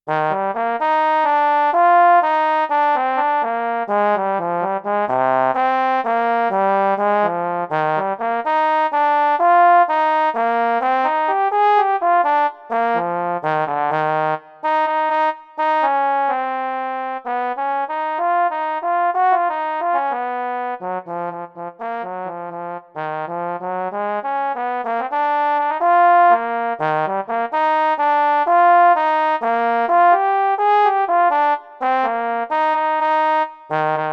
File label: Euphonium Technical